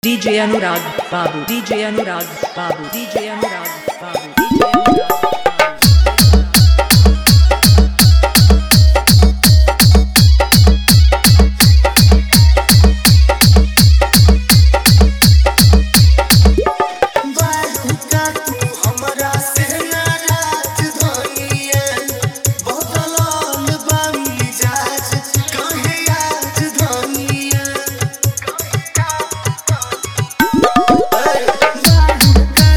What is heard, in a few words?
Bhojpuri Lagan Song